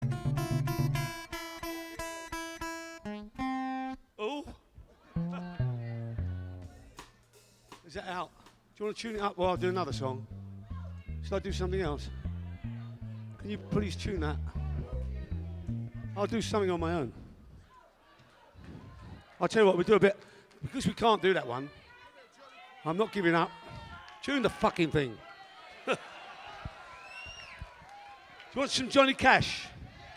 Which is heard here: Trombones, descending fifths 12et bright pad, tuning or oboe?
tuning